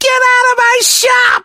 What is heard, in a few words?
hurt